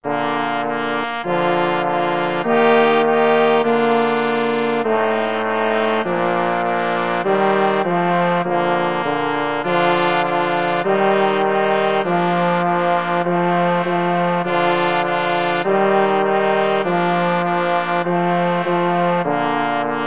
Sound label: twinkle twinkle, flexible brass ensemble 4 players